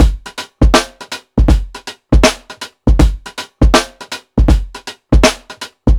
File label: PICCOLO SWUNG 16TH, breaks, drums; Basement drums vol 2